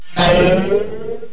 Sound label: teleport